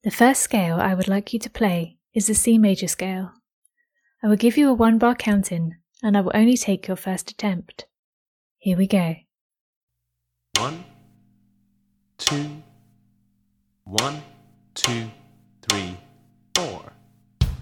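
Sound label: Scales C major A natural minor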